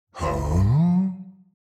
Demon king lord sigh surprise

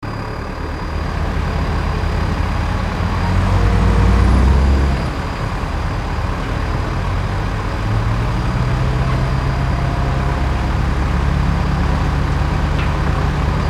Truck crane moving and lifting

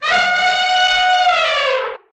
Elephant trumpets medium distance

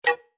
System confirm beep

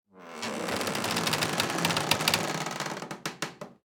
Earthquake wood textures forced bending

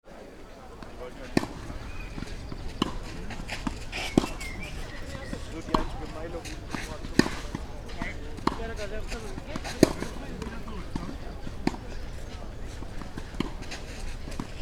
Park ambience next to a tennis court

